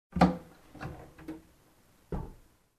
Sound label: Storage Door close